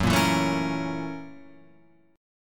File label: F sharp 7th Sharp 9th, Guitar, Open F; F#7#9 strummed